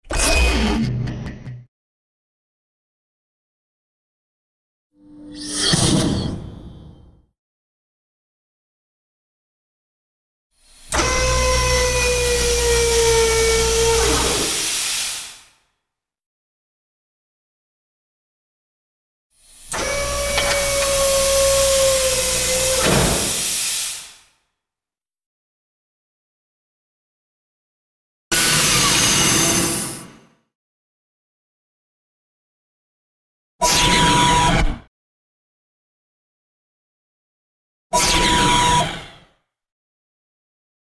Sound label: sci fi doors